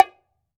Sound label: H-BONGO OP; AKAI S-Series CD-ROM Sound Library VOL-5